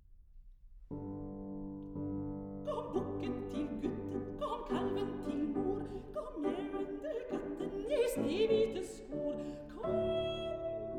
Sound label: Children's Songs for voice & piano; Grieg; Barnlige Sange; 3． Lok (Call (Farmyard Song))